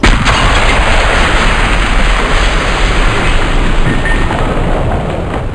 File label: boom, ambience